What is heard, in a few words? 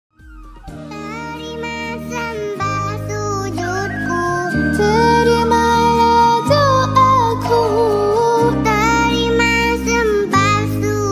arabic ringtone